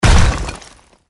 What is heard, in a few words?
Smash; Sfx Ui Title Smash1; Godzilla Smash3